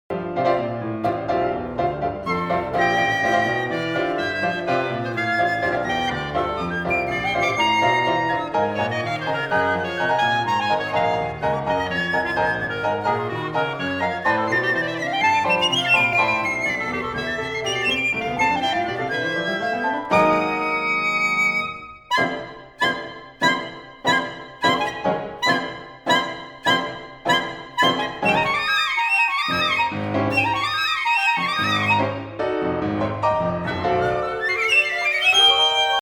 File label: Night Flight for piccolo, clarinet and piano